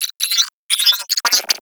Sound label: Computer Beeps; Warframe; Guild